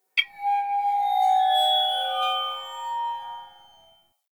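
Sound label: Cave Crystal Magic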